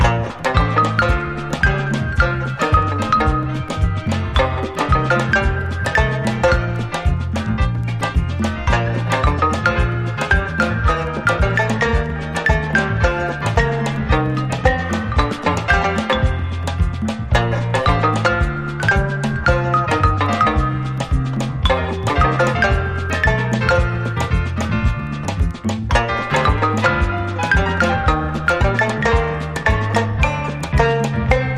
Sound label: banjo bango xylo